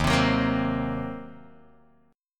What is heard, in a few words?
Eb Minor 6th, Guitar Drop D; strummed